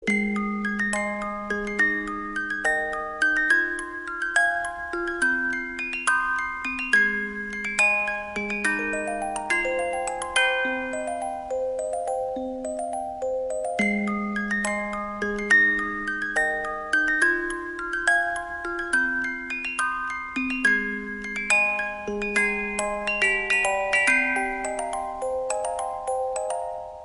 Spieluhr

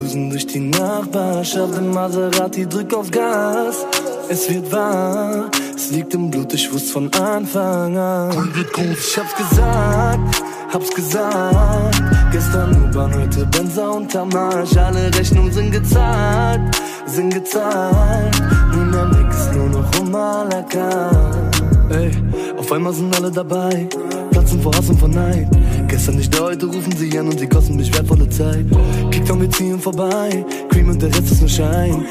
4 uhr, Klingelton

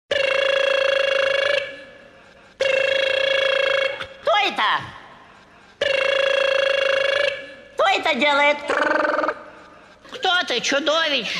Telefon

